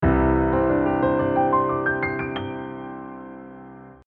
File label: Csus2